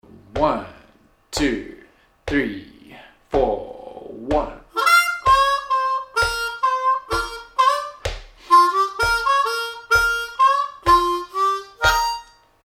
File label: Lick, harmonica